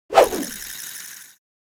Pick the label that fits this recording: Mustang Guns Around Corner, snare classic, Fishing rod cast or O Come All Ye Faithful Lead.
Fishing rod cast